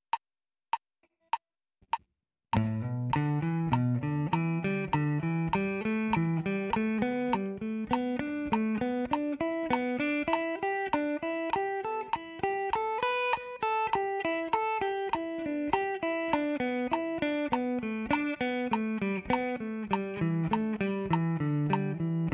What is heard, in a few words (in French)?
Gamme pentatonique mineure brisée 4 notes